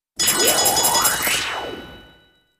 5 Rapid Bell Dings with Synth Swoosh ( I.e. Win Signal ); chimes & bells